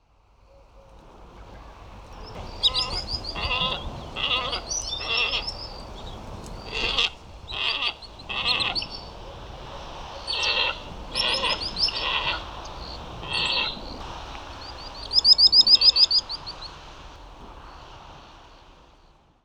glossy ibis; اکراس سیاه

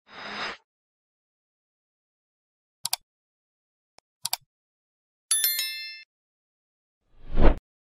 Subscribe bell

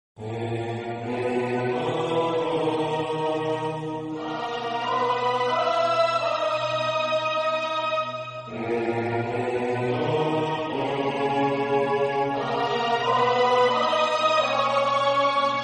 Space ringtone

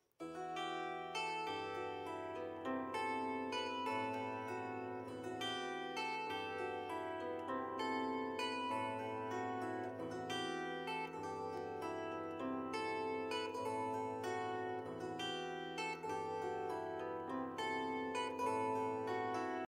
Kobza; کوبزا

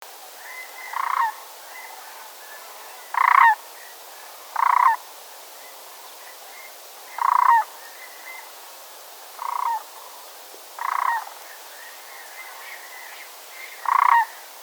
Sandhill crane

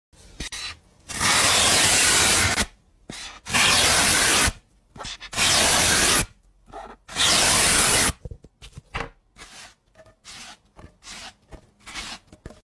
Flower sponge, صدای برش اسفنج گل

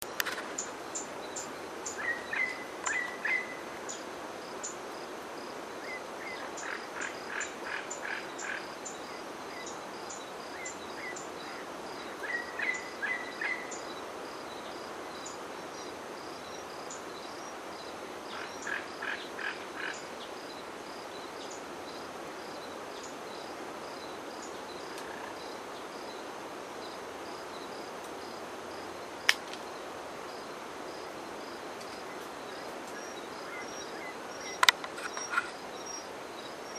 Red tailed amazon; پرنده آمازون دم قرمز

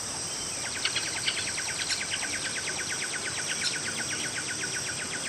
wilson's phalarope

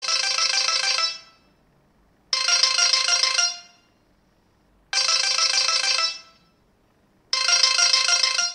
Asus ringtone

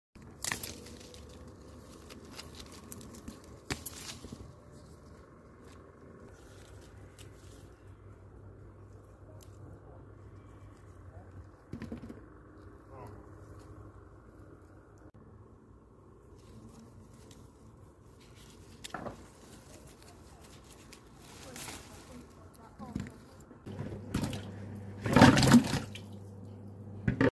Picking fruit from tree; چیدن میوه از درخت

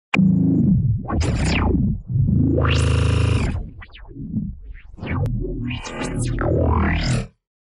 granule layers

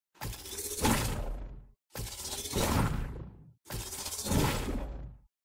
Flying Disc

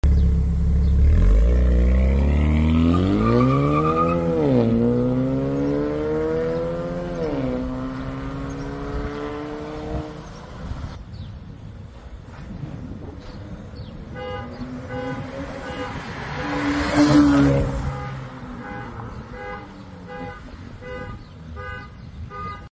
GAC EMPOW; اگزوز

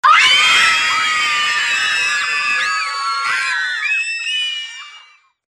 Screaming child

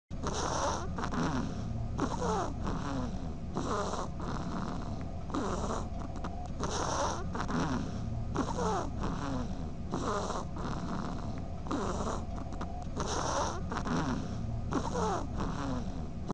european edible dormouse; اشگول معمولی

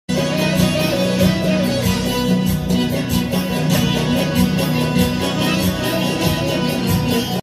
tar Azerbaijani; ساز تار آذربایجانی

